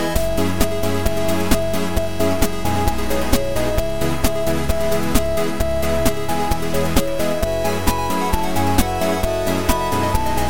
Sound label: 8bit